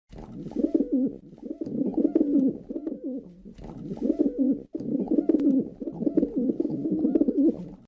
Pigeon Cooing; birds

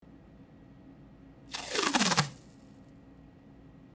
Packing Tape

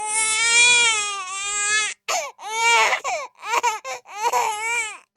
Baby Crying

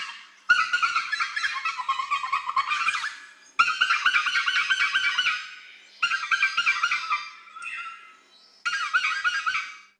Monkey Calls in Jungle; forest jungle ambience

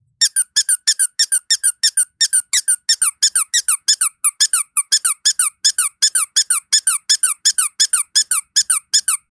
Funny Squeak Sound, cartoon

